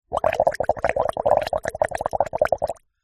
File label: food drink bubbles blow into glass water through straw; zapsplat